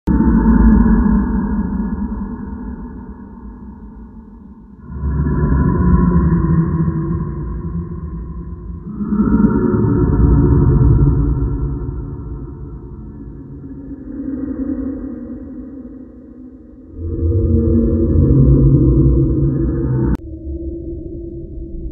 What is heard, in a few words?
halloween ambience horror sound